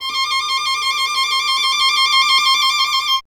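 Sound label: STR violin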